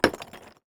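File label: rock ground impact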